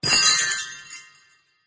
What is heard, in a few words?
Gain Gold